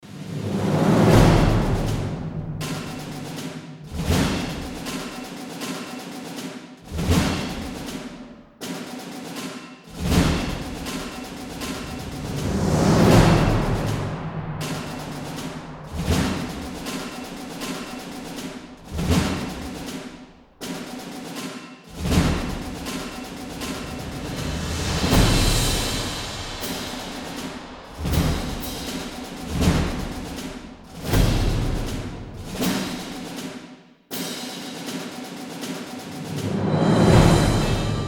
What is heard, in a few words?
Perc; Front Mission Evolved